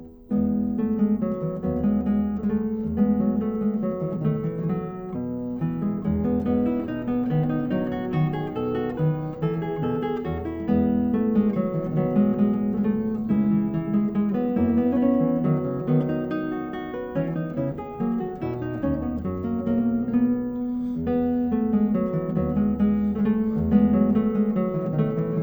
Partita for solo violin in E major Menuet II; BWV 1006; Bach; The Six Sonatas and Partitas

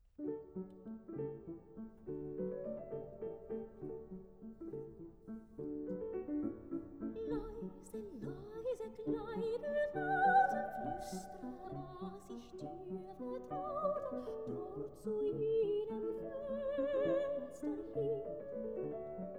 song for voice & piano; An die Laute ("Leiser, leiser, kleine Laute"); Schubert; Lieder

